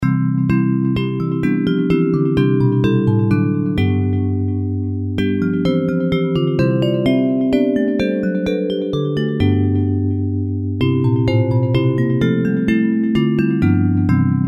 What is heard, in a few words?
Bells; Wake Awake for Night Is Flying; Praise